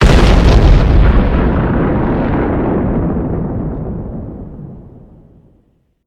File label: Boom; Elite Gaming FiveM; Sound-Barrier